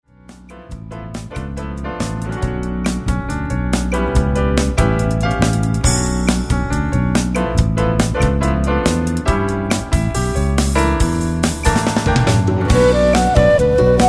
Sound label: Bossa